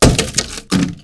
wooden golem die

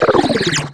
shc potent venom

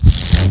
priest lex divina

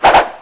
turtle attack; turtle general

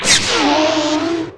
reverberation

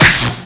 enemy hit wind

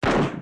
golem move

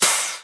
goblin stand; steam

